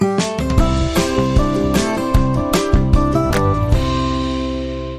Dance Forever Sting

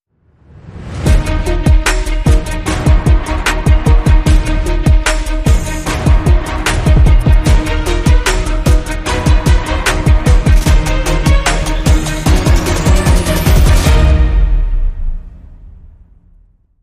Hybrid Sting

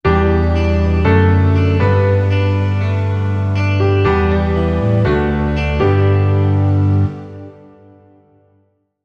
Forever Sting No Drums

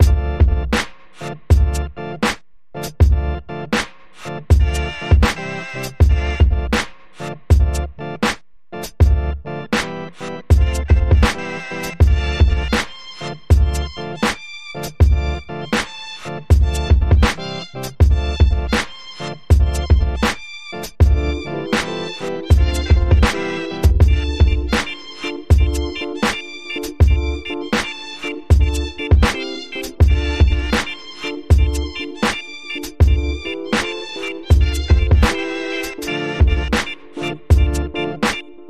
Electronica

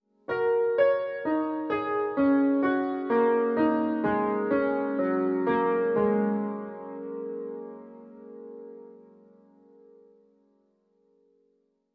Orchestral

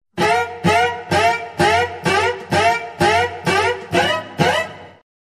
Cello Danger

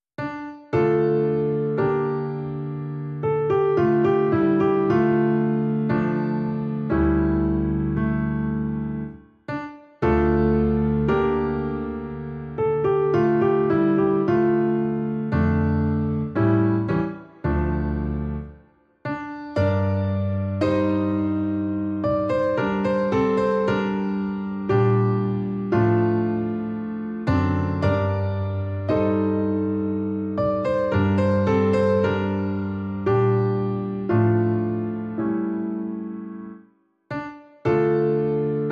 Solo Piano

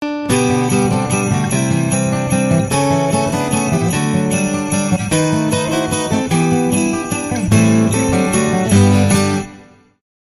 We Wish You A Merry Christmas

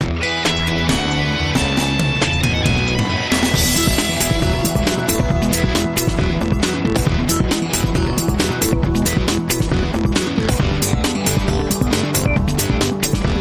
Breaking News Promo

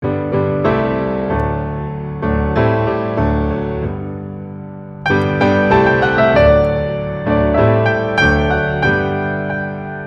Ding Dong Merrily On High, without Jingle Bells

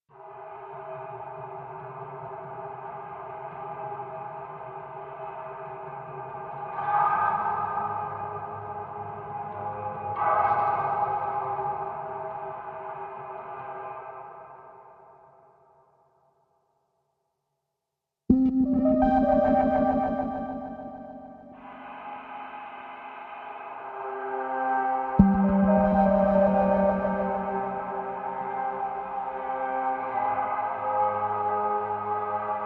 Synths

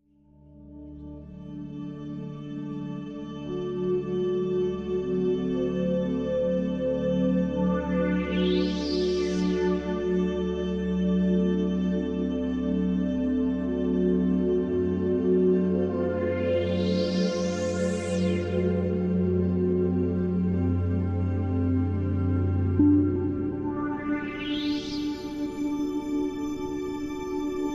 Riddle Drone Full

